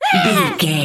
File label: voice fun small character emote angry